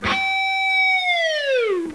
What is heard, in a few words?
tag